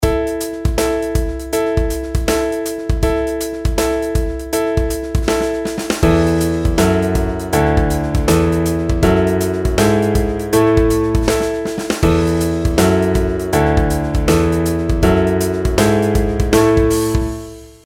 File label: pitch patterns skipwise